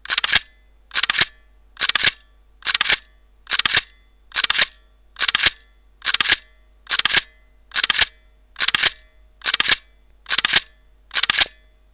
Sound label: K-m continous low JPEG; Pentax